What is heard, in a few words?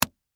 Impact Slap Face, Smack; Slaps; Combat; BlastWave